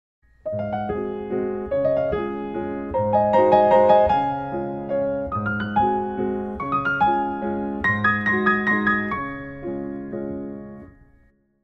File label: Landler; Schubert